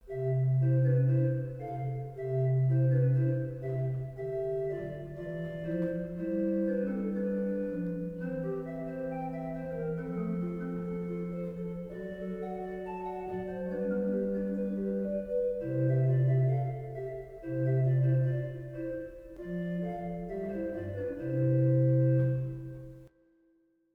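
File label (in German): Orgel, pfeifenorgeln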